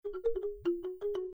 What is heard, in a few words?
safe zone entry; RallyX